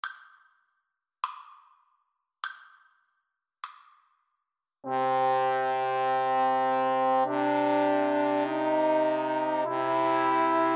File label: aequale, Trombone Trio